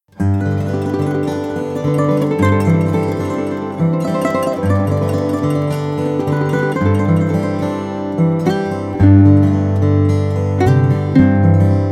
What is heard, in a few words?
guitar